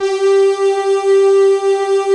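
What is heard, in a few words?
synth choir; 90s Sample CDs; Club-50 - Foundations Roland; Scats Choir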